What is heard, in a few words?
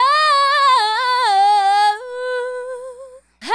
Adlib, Hip-Hop and RnB Vocals